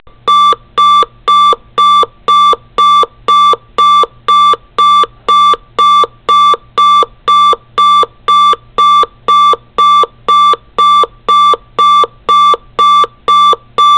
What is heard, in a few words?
alarm phone